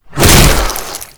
Infested Ancient Small Arm Swing; Warframe

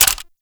Gunblade Mech; Tenno

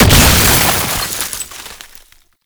Snow Globe Burst; Warframe; Nineteeen Ninety Nine; Deep Archimedia

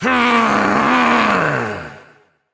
Evil Twin Doppelganger Frustration

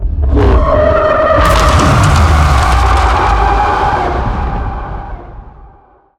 Tau Sentient Vessel Scream; Warframe

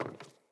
Sandals Walk Wood, footsteps; Player

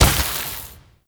Vial Ability Corrosive Shatter; Warframe; Warframes; Alchemist; Elements

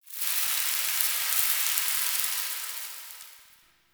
Sand Fall One Off; Warframe; Man In The Wall

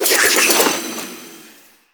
Kunai Reload, Weapons; Warframe; Choir

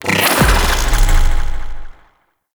Zanuka Companion Steal Eximus Cast; Warframe; Characters; Abilities

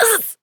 Pain, Adult Operator; New War

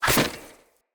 lantern net break; Objects; Ode Lantern Crate

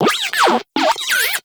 Moa Compassionate Vocal Greeting; Warframe

